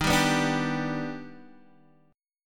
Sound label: D sharp Augmented Major 7th D sharp, Guitar, Half Step Down